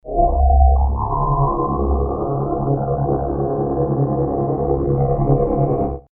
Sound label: sweep tonal hollow sync